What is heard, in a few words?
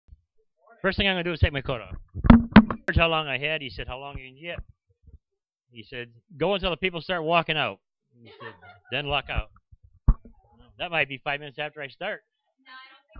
Rain; Dave Hinkley